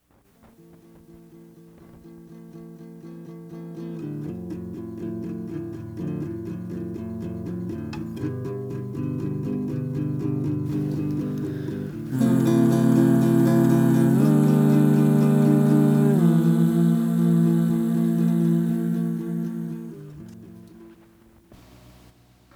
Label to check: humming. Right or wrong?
Right